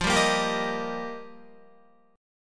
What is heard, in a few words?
F 7th Suspended 2nd Suspended 4th, Guitar; F7sus2sus4; strummed; 12,10,10,12,10,11